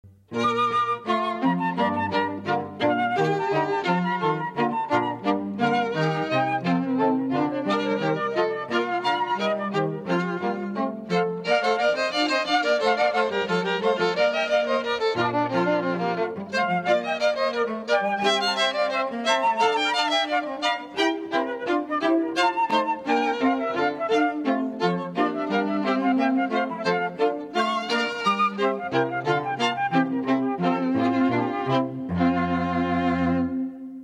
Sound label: Flute Quartet; Boyce Symphony1 Allegro; Cantilena South Florida